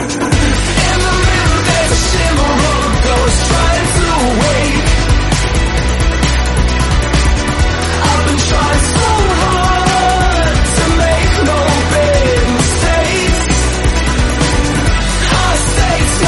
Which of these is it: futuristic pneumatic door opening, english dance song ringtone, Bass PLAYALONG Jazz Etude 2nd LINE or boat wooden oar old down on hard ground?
english dance song ringtone